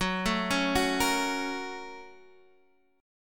A sharp Minor Major 7th Sharp 5th

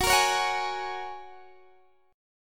Gb Major Add 9th, down, Guitar, DADGAD

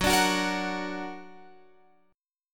Gb Augmented Guitar Open F; x,13,14,13,13,13; Gb+ strummed

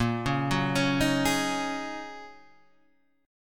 Gb 6th Add 9th Bb, guitar

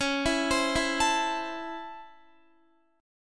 C sharp Minor Major 7th Sharp 5th C sharp Guitar; C#mM7#5 arpeggiated; x,16,16,17,16,17; JGuitar

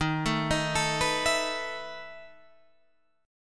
E Major 7th Suspended 4th D sharp

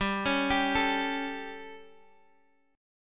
G Suspended 2nd Flat 5th, Guitar Drop D; chordshape; down

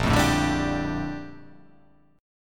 A sharp Major 13th A sharp

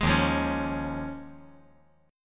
D sharp 7th Suspended 2nd Suspended 4th

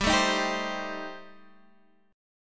C Minor 6th G, chord

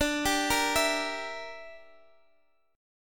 E Minor 7th Flat 5th D

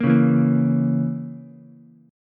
A sharp Diminished Ukulele, chord; strummed; JGuitar

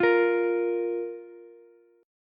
A sharp 5th A sharp Ukulele; strummed; JGuitar

